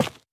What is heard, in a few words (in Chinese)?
凝灰岩破坏声